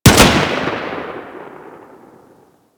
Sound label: Railgun Shot; DE; Warframe; Weapons; Sentinel; Sent Elec Railgun